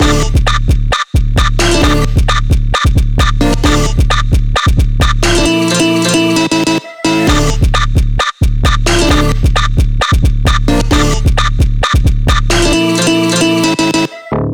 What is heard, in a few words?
D Min 132 BPM, Melody Loops; Vanquished; Cymatics